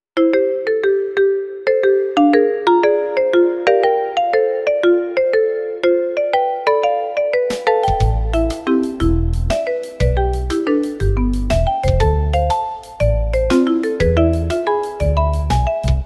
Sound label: marimba